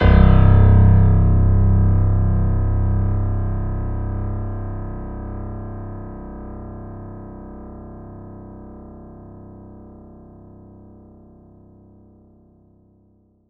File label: C1, Synth Line; 90 s Sample CDs; NiceBeats; SynthLine VOL-15